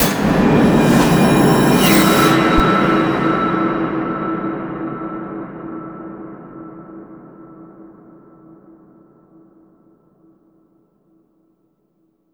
sci-fi sting; Spectrasonic Distorted Reality 1

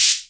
HAM CYM, Hammond Rhythm2

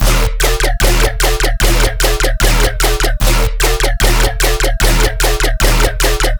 bass loop 150 D#; healthybreakfast; Virtual Riot

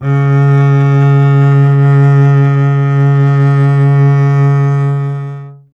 STR CBS, Solo Instruments; Cbas; Miroslav Vitous - Solo Instruments VOL-2

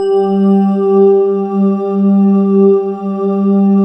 VC Bell; Rubber Chicken Software Sample Bank

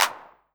CLAP, Drum & Bass, 135 BPM; Ueberschall; Drum & Bass Resonance

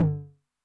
High Tune Med Decay, 909, MID TOM; classic machines; 909mod; MT - MID TOM

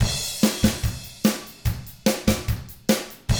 rock drums

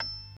PERC; B3 FULL

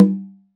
Conga Battery, EMU E-III

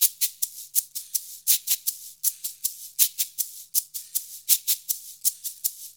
80 MIX, Percussion Loops

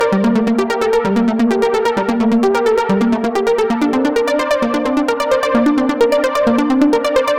G Seq Perky; Zero-G; Future Wave

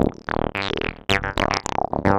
303 loops

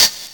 CABASSA, Ragga & Dub Reggae; USB Soundscan vol.27; AKAI; CABASSAS

